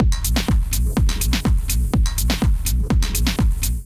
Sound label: Kit 124 bpm; Junipero Kit; Ableton; Punch and Tilt